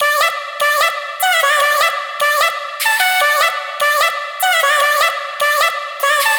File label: Vocal Loop Dmaj 150BPM; Vocals; GhostHack; Ghosthack - Advent Calendar 2022 - Day 12 - Vocal Loops